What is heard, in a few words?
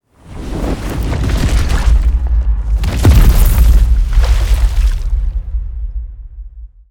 giant monster fall